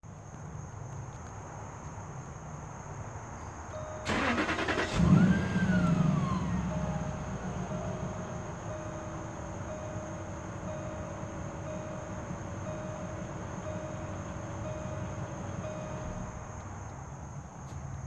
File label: noise at start up noise start up